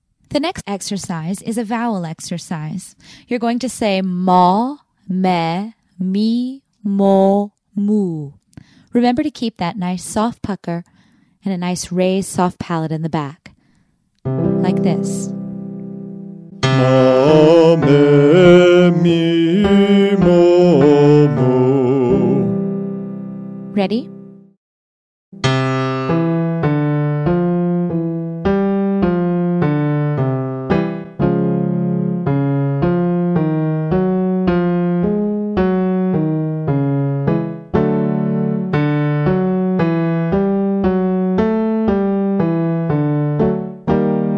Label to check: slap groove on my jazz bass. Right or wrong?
Wrong